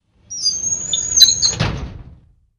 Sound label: Drawer Metal Close